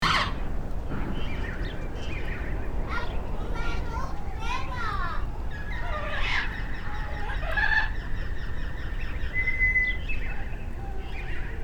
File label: Desert village ambience light activity loop